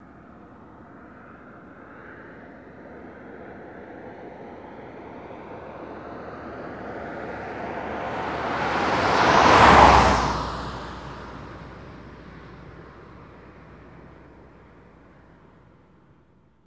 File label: Cadillac 1990 By Fast